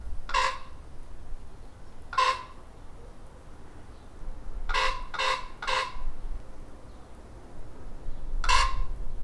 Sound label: Corvus corax croak